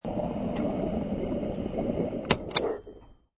Gas Pump Off, Gas pump running and then trigger released; Machines